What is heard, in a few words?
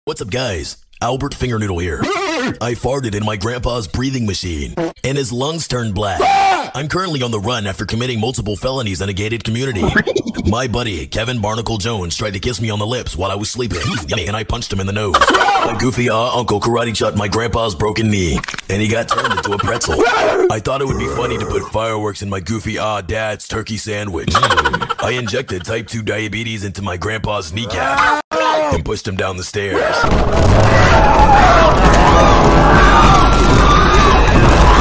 FUNNY GOOFY AHH; memes